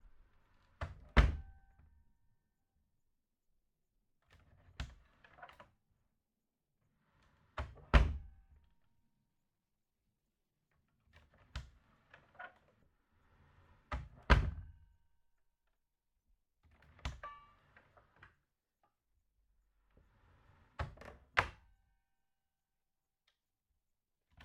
Door, wood, close perspective, side angle, medium; Tone Manufacture; MicroTone Doors

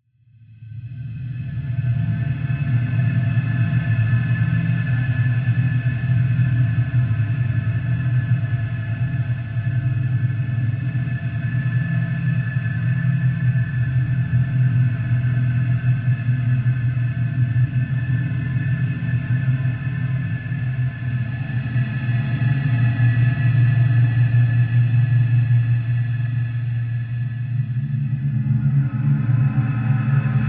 DRONE, PARANOIA

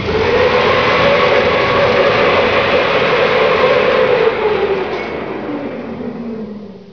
diesel stop; hl2

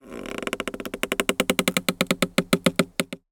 wood creaking tree; BlueZone

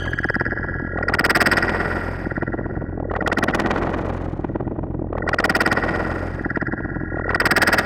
Heavy Machinery, Background Machinery; Julian Ray

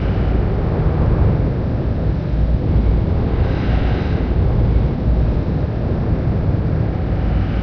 deck room; hl2; borealis

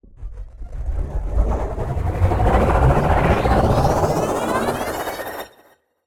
Air Speeder Startup, Futuristic Vehicle; Sound Spark LLC; Hyperdrive

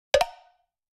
Heads Hit Together, slapstick comedy; Coll Anderson

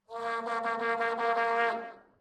Vuvuzela; TheWorkRoom Audio Post

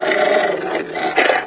peek squeeze, antlion guard near; hl2

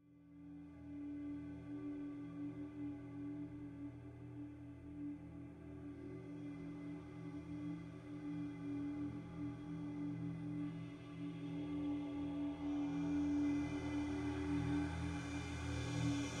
Evolving Guitar Swell, Cinematic Drones; Justsoundeffects

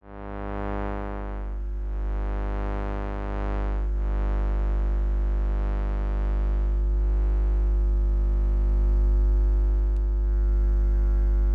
Electromagnetic Field Toaster; Fox Audio Post-Production; Electromagnetic – Field & Emissions

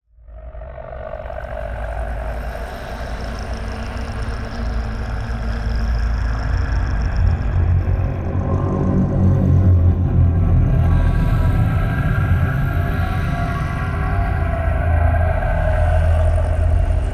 DRONE Bowels; SmartSoundFX; Dark Textures

